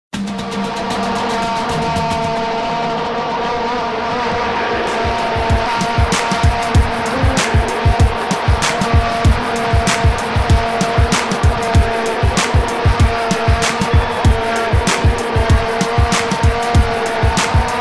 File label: vuvuzela hiphop; mini ringtone songs